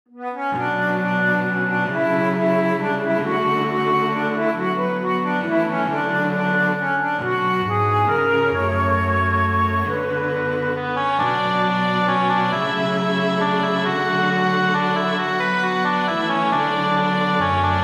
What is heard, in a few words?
mazurka